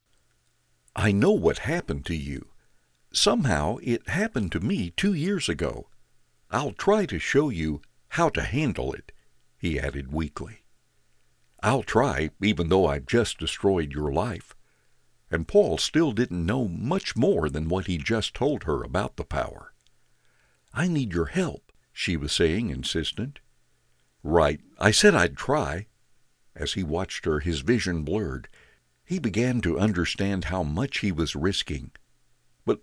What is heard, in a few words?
Whisper